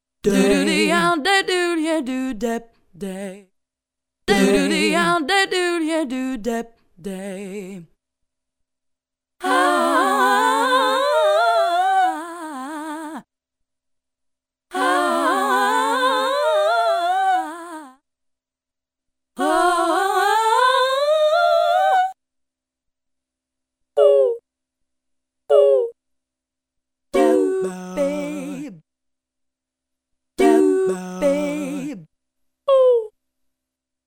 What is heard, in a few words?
scats